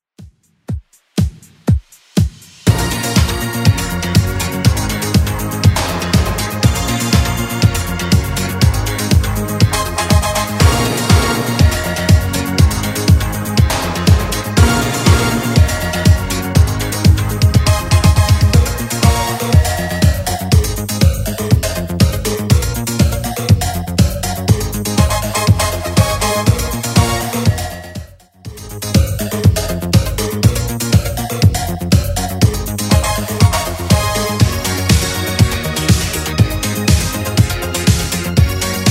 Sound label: 80s Redrum, 121bpm